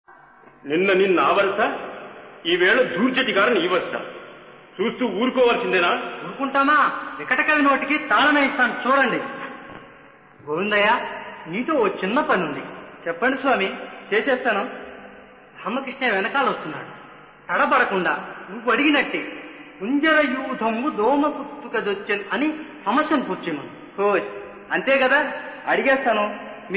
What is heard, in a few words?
Padyam; TenaliRamakrishna